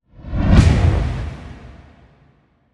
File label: Swell To Impact; bottlerocket